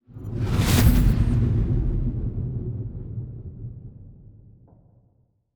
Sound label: battleship move; aquatics